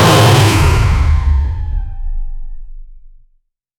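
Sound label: futuristic steampunk engine, activation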